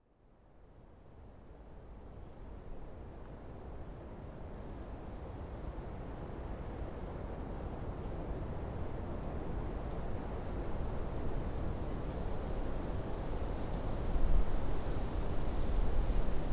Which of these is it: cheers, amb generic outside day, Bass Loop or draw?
amb generic outside day